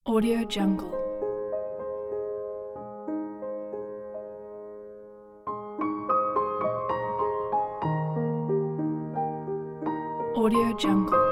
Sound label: calm dreamy piano